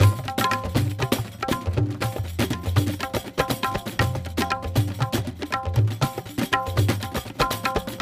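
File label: music world music action part c percussion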